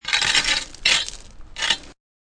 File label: Metal scraping on rock.; Scrapes; Objects